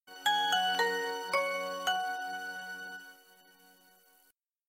Nokia startup; Nokia 1112

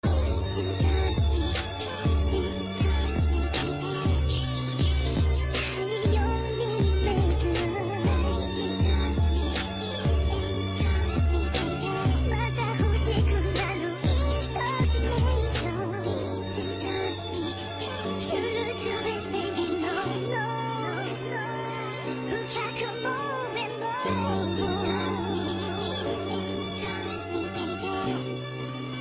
Chip

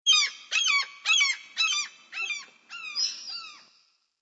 Seagull Sms

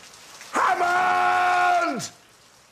HAMMOOOOOND

